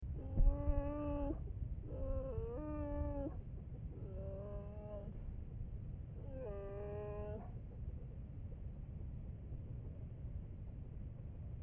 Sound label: crying, bear sounds